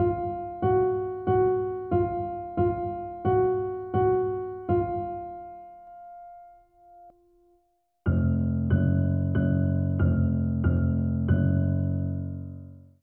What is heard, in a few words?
tension; fisk city